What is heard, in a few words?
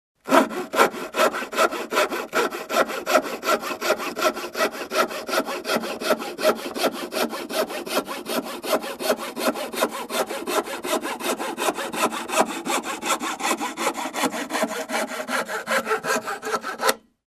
Hand Sawing Wood Up-close